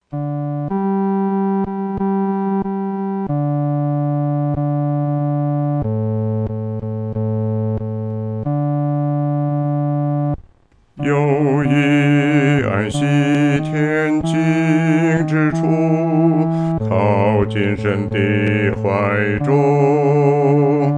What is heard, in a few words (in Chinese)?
独唱 第四声; 靠近神的怀中